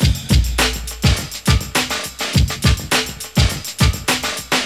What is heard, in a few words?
BEAT, Beats 101 bpm, Hip Hop; E-MU Formula 4000 Series Vol. 1; Hip Hop Nation